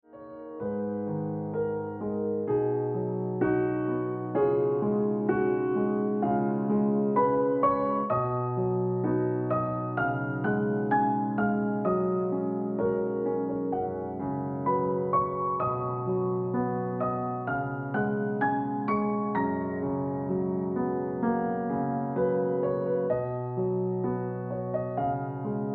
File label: Exception Ringtone Piano Music